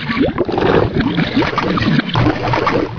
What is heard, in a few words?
bubble machine high, ambience